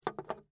Wrist Watch Down, Foley, Wrist, Watch, Set, Down, On, Counter; Clocks; BlastWave